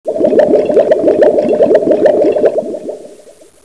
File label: bubble